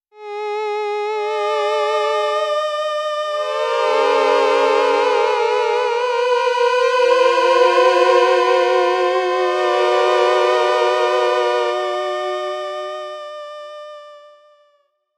Sustained notes with a violin-like timbre, Miniature Theremin; ringtones; Liberal use of vibrato